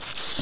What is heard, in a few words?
Rubber Duck Squeak; 1997 MMX LAN PACK (win 95); Army Men